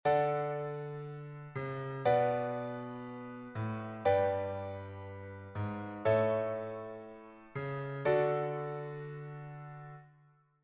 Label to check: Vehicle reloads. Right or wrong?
Wrong